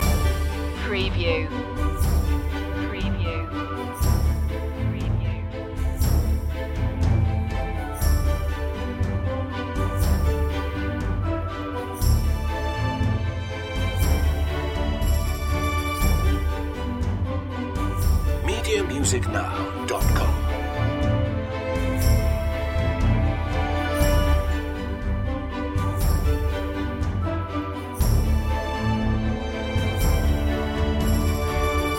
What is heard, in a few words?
blooming spring short loop